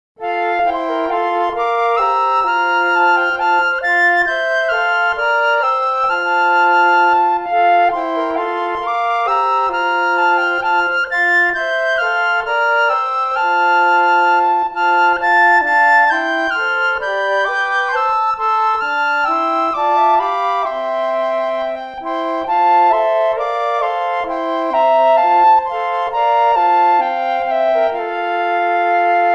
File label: Flutes; Teschner